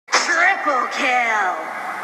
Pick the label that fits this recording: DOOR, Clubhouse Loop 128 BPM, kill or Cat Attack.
kill